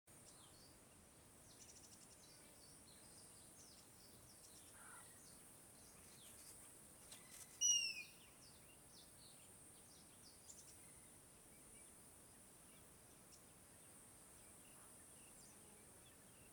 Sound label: ambiance